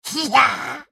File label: ultimate effort; Fizz